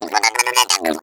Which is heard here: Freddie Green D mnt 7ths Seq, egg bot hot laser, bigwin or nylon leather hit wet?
egg bot hot laser